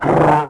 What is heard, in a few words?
Bear Die Vox; Civ4 warlords